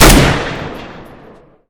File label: scar20 unsil; arccw go